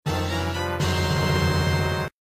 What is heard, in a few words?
chest; pokemon reborn e19 2022